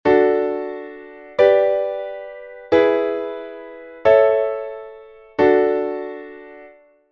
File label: progression, secondary dominants